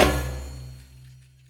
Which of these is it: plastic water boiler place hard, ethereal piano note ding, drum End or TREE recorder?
drum End